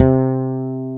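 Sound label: fretless bass B2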